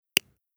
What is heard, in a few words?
scale